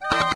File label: the 60's usa maximize, windows 9x sound schemes